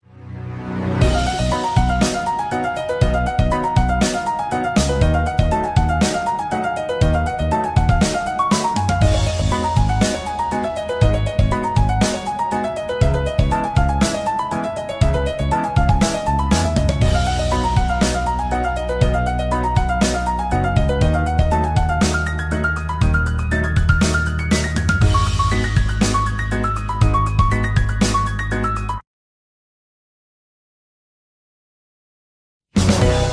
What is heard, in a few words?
Sharp Piano Energy, イントロのためのピアノ曲; intro